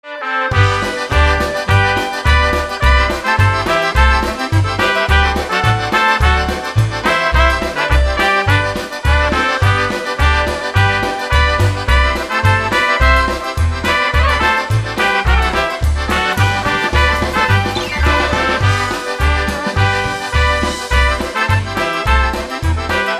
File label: Polka Polka